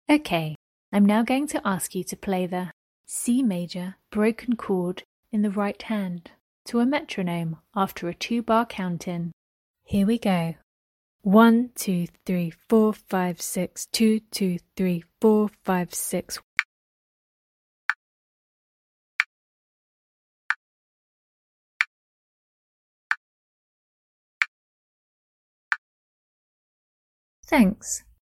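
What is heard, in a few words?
C major broken chord right hand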